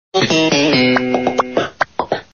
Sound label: transition